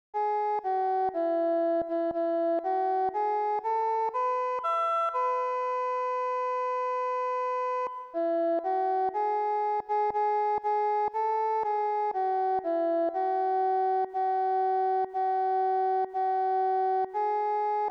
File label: OrganPipe